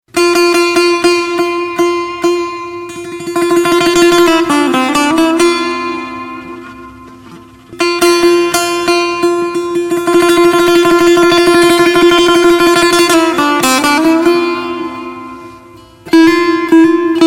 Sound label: saz